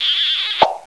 Kiss; Princess and the Pea; SoftKey

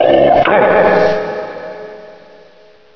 fire big; Hexen II

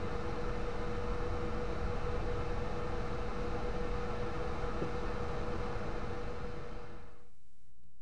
bath vent

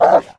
wolf; kult

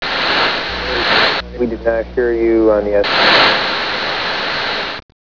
TUNE

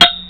SWORD; Arena Games 3.0

